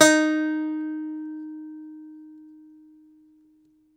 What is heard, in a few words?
DOMRA, Russian Strings